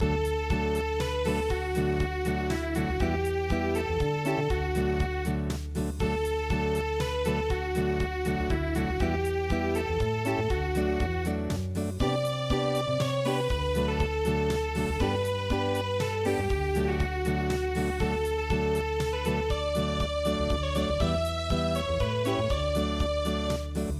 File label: Zigeunerleben Unplugged